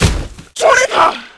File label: fall; quake2; fbetch